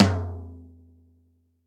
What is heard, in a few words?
Long Room Reverb Tom F# Key; tom drum samples